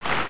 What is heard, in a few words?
Sound brush